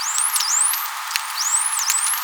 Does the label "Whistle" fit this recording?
Yes